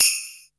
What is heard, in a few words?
DR-660 Perc, Drums, Boss DR-660